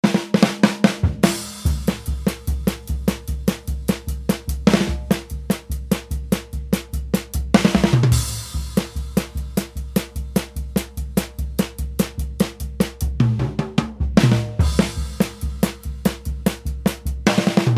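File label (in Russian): барабаны ритм короткий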